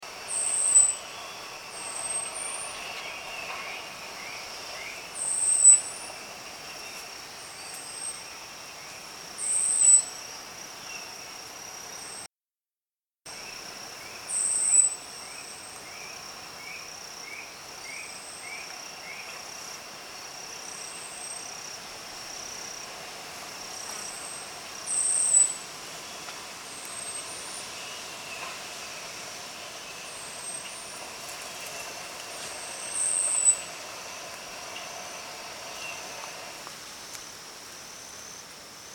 Longtailed Ground (Bush) Warbler Kitanglad

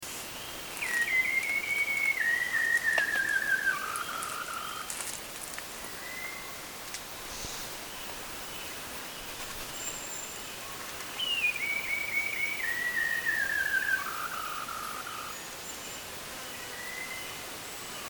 White browed Shama Subic

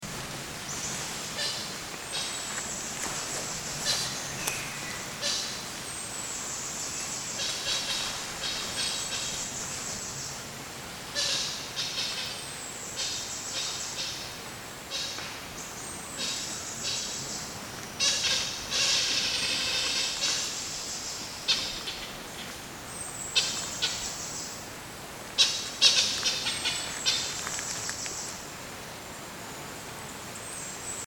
Luzon Hornbill; Makiling

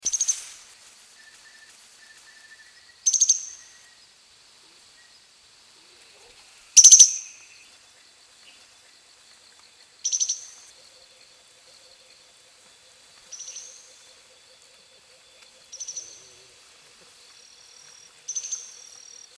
Bukidnon Woodcock Kitanglad

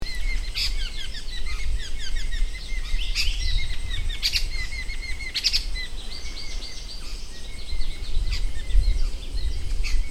Chinese Babax, birds; Wawu Sichuan; China

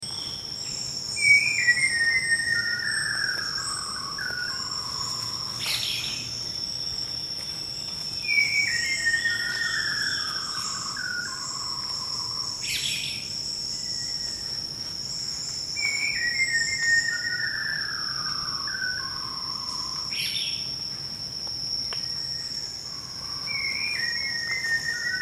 White browed Shama Makiling

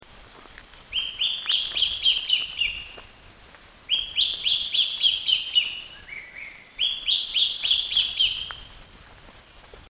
Phil Hawk Cuckoo Makiling

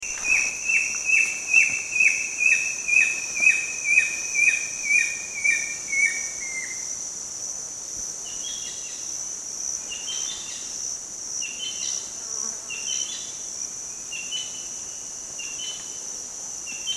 Rufous lored Winchells Kingfisher Bohol

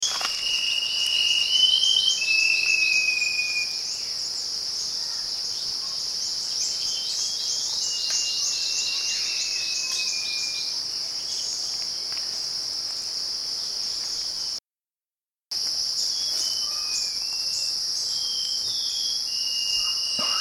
Black Shama, Cebu

